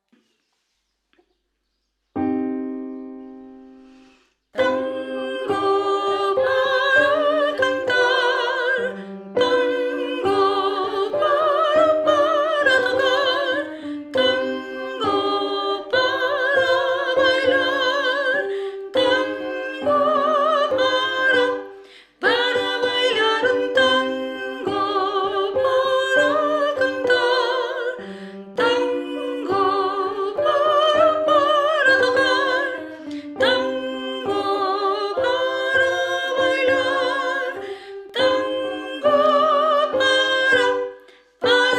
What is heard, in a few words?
Tenor